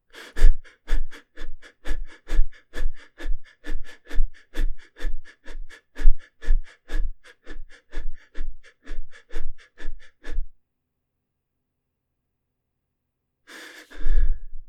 Quick Running Breathing And Panting

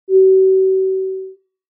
Airplane Cabin Attention Tone

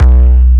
Bass Giant Phat Kick

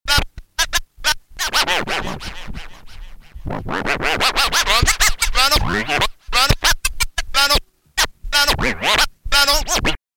Dj Puzzle Scratch

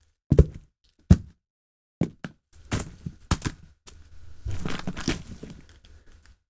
Fruit Debris

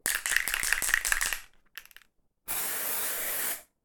Spray Can Shake And Spray

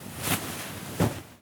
Rustling Duvet

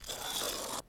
Pressure Plate Stone

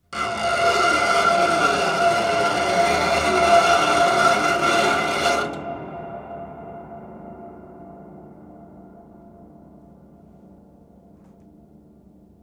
Scrapes

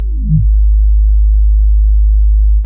Subfrequent G 2

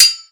sword

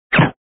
Gun Silencer

